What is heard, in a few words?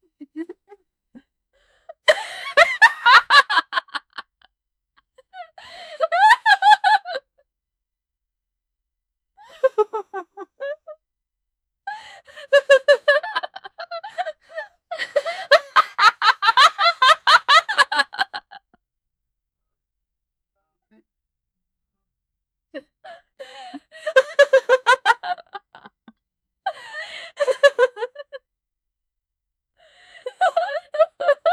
female evil laughter